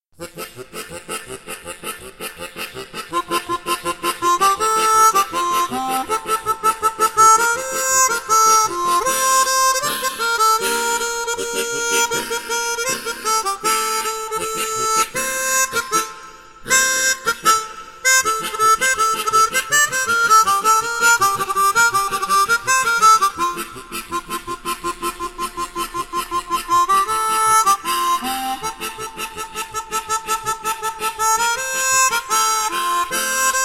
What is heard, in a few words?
clarinet